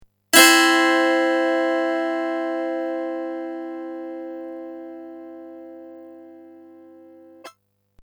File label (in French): Tierce Maj Pythago